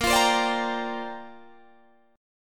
A sharp 6th Add 9th, Guitar Open D

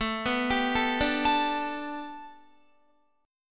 A 7th Suspended 2nd Suspended 4th Guitar; A7sus2sus4 arpeggiated

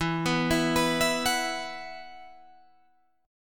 E Suspended 2nd E, Guitar, Drop D; down

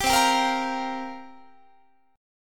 C 7th Suspended 4th, Guitar, chord

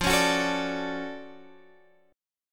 D-Major 7th F

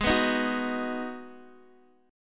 G sharp Minor 7th, Guitar DADGAD; x,11,9,8,9,x

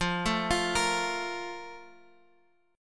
F Major Add 11th F; Fadd11 arpeggiated; JGuitar

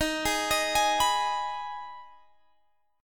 Eb Suspended 4th Sharp 5th, Guitar, Open G

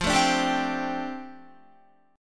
F 6th Add 9th F down Guitar, chord

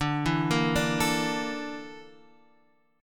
Bb Major 7th Flat 5th

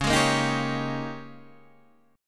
Ab Major 7th Suspended 2nd Suspended 4th Db

